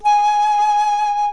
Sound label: G sharp 5, flute; modern